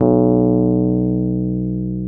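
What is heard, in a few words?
Fender key bass B1; Sonic Reality; Vintage Timetraveler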